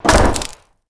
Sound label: board damage light; hammer; melee; tfa starwars melee weapons pack